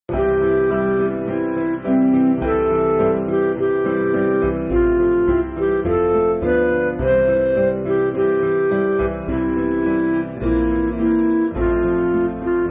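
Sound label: Midi, Music Backing Files for Churches; Come Ye Disconsolate; Consolator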